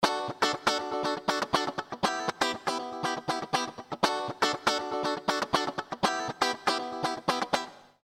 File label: funky tite square